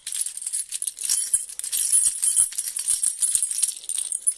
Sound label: jingling keys